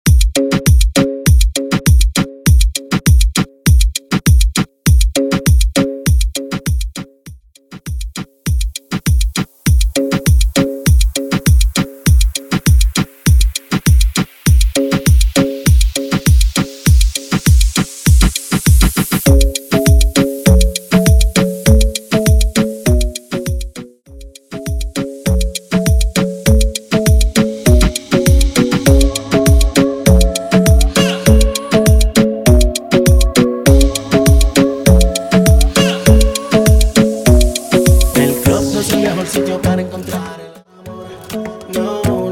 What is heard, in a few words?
Moombathon 100 BPM; moombathon bootleg remix